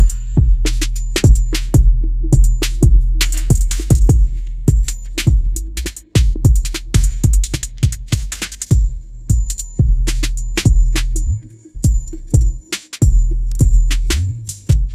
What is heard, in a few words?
ringtone hiphop